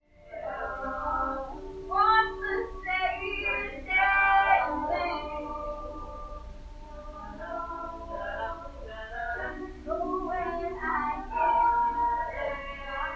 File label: acapella